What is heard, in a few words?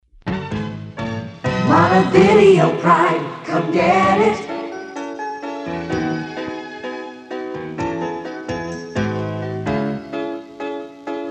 jingle; montevideo pride